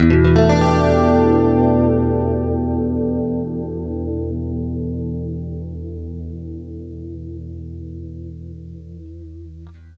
CLEAN EM9, Guitar; East West; Steve Stevens Guitar Samples Collection

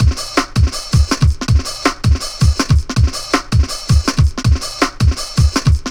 162, Jungle Breaks; Flow; Jungle Warfare 1&2

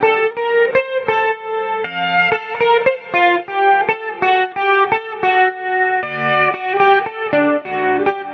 G Gtr Black Tea; Zero-G; Future Wave

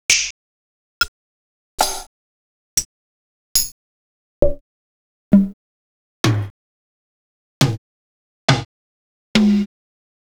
Vinyl Perc; X-Static Goldmine

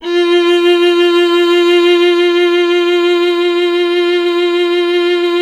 viola F; Miroslav Vitous; Solo Instruments VOL-1

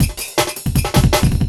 Beat, Futurist Drum & Bass; Drums & Percussion

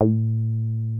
303 A2, TB 303; Hollywood Edge; Giorgio Moroder Rare Synthesizer Collection

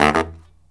Killer Brass Riffs

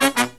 LOW HIT, Brass

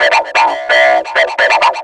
NRG SFX, Trance

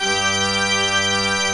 PIPE ORGAN

